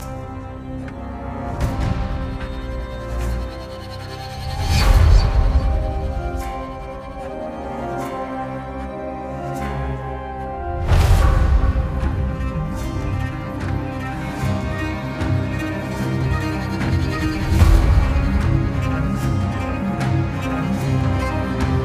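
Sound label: music fight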